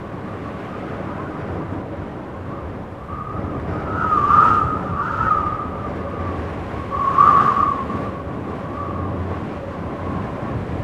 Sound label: wind loop desert